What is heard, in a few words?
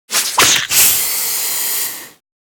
Snake rapid strike attack